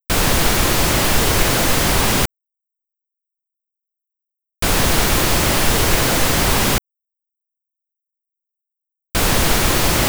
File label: three bursts